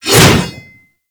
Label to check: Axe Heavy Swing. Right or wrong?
Right